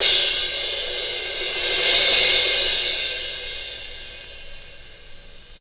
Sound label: Zildjian cym 12